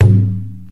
verby tom drum single hit g sharp

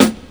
raw snare sound a key

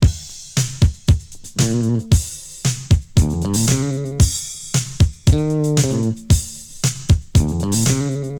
115 bpm drum groove A key; drum loop

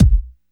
verby steel kick drum sample b key; kick drum samples

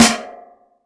huge snare sample a sharp key

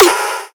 trap snare drum sound f key

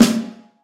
subtle reverb steel snare drum sound a key

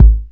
bassy kickdrum sample g sharp key; kick drum samples

